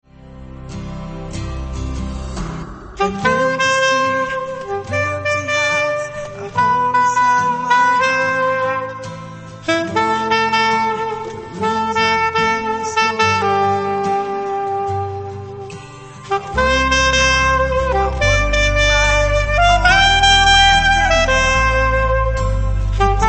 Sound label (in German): mit Sax Ausschnitt